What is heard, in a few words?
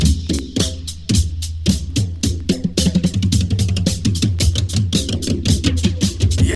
73 bpm drum loop e key